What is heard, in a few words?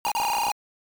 Brake; Sonic WindowsZone